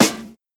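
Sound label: rich top end snare drum sound A key, snare drum tuned to the A note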